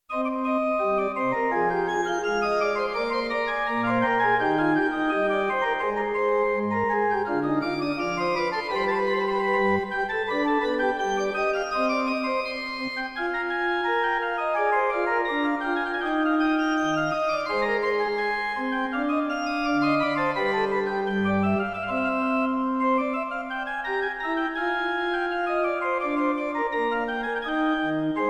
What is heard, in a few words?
Plays Organ, MT32; KQ4; Rosella